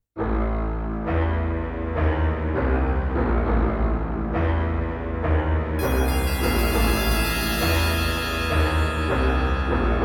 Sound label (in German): zwischenmusik